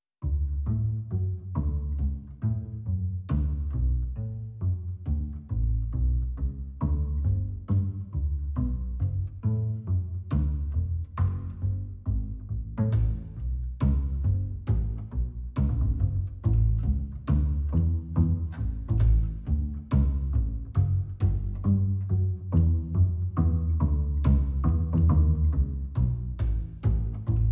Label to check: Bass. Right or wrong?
Right